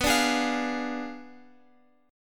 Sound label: B Diminished 7th B down Guitar Open F; strummed